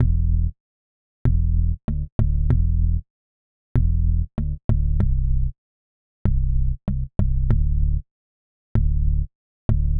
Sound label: Latin HipHop Synthbass Emin; Digidesign; Pro Tools Essential Loops